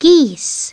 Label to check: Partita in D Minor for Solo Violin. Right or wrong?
Wrong